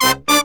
120 AM R, BRASS SALSA; Best Service ProSamples vol.14; AKAI

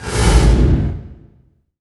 IMP FLAME, Cinematic Impact

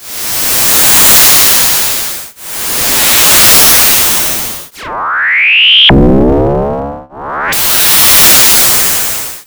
DIE, SAD FX; USB Soundscan vol.33; AKAI; Disjointed Textures

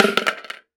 Thunder Drum

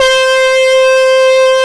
GUITAR LEAD

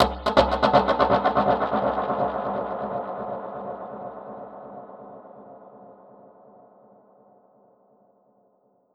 Perc Hit, dub percussion; musicradar

